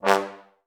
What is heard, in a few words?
TB STAC G1, Advanced Orchestra; Best Service; Peter Siedlaczek's Advanced Orchestra